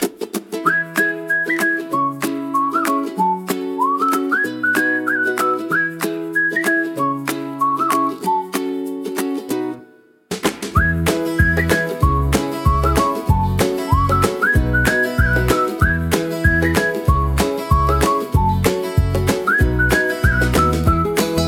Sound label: Acoustic Folk; キャンプ・モーニングルーティン